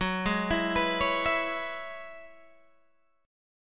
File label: Gb 7th Suspended 2nd Suspended 4th Gb down Guitar Open G